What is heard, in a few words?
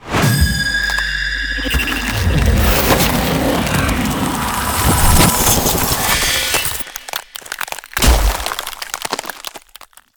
ice spell ultimate; Ultimate Ice Spells; Frozen Soul Shatter; Arctic Aura